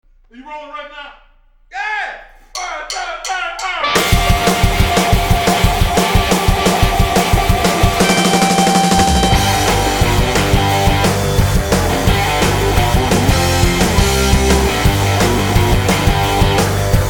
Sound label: Rock